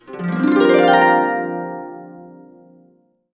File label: Harps; Complete Harps; USB Soundscan vol.62; AKAI